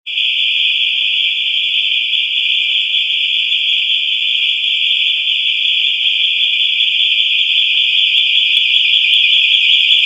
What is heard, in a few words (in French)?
alerte attentat intrusion